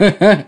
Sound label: "huh huh", voice; ooh ahh